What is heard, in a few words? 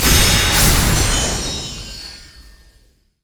quest complete; odin